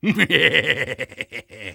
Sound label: laugh evil; Soldier